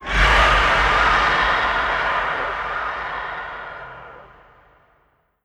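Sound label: rear right, antlion distant scream; creatures; Model Pack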